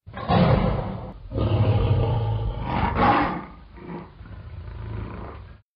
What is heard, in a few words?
lion